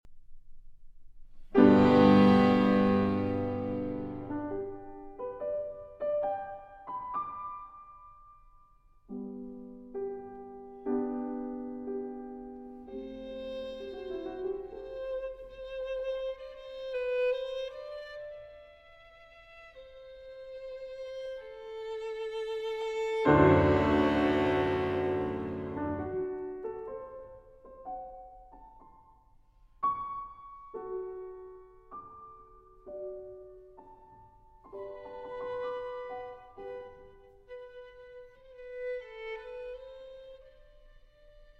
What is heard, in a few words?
Piano Trio in G Major, Adagio. Allegro Vivace